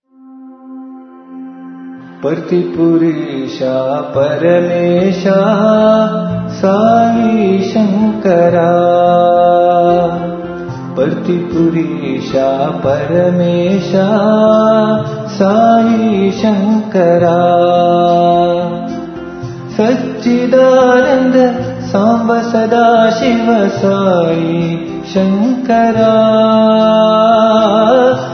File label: Bhajan; Parthipurisha